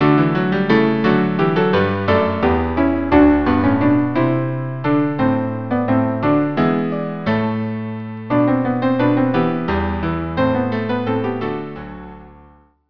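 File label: Two Dances violoncello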